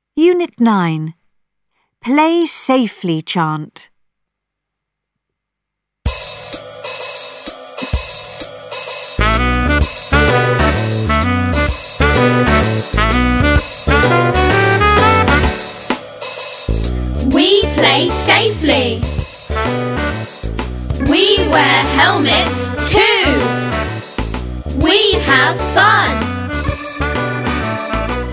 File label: chant; play safely